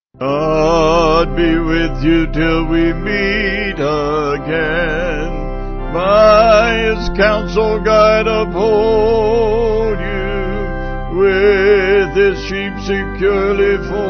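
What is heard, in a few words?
Vocal Organ, church music backing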